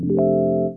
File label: Cue Complete; Flash FXP